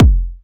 kick machine

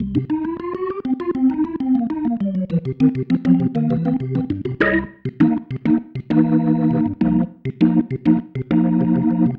Pattern, Organ

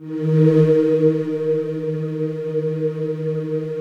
Matrix 12 choir, vintage keyboards; Big Fish Audio - First Call Vintage Keyboards

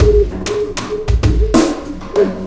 Sky Lab Beat, Processed Drumkits

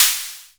hats, drum machines, FutureRetro FR777

